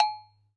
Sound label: Bell Lo